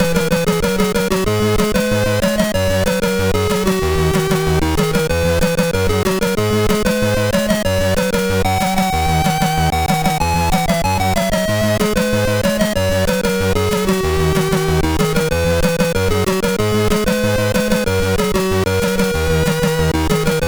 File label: sid, MOS6581R3; Rorschach; Contact SDS